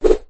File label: throw stars; nickelodeon basketball stars 2015